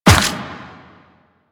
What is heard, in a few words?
Run Dirt, footsteps; Warframe; Quests; The New War; Kahl